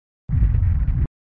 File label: Sound SFX, flipper; sttng